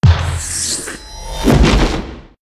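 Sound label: mushroom death, monster; Senillinea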